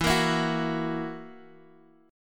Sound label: E Suspended 2nd Flat 5th E, Guitar, Open G; x,x,2,3,5,4; down